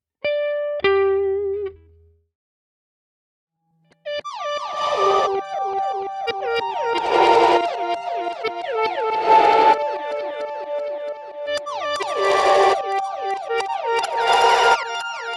Gated Reverse; MXP

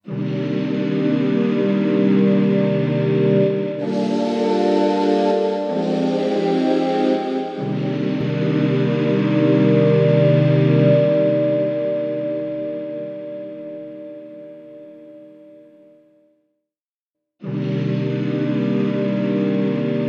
Synth Clean Ambient; H90 Programs

